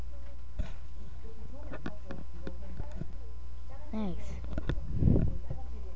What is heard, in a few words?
fire